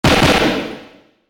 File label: banban; ドラムロール (3）のジャーンの部分をなくしたもの。ドラムロール（3）の長さ調整などにも